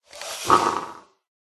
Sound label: attack voice up; orluphia